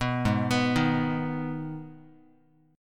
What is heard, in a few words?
Ab Minor Ab, Guitar; Abm arpeggiated; JGuitar